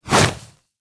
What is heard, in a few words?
Fist Swipe; Warframe; Pacifist